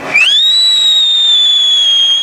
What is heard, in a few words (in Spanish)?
chiflador